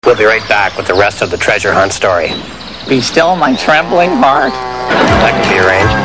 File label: Trembling Heart; RedGreen